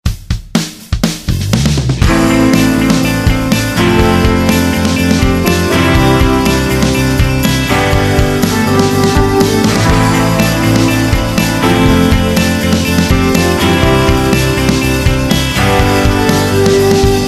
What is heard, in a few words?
Pop Rock